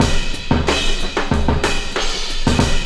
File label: CRASHY 95, loop; 0- 99 BPM